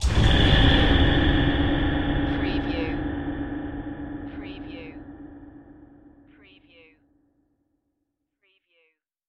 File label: Hit Ghostly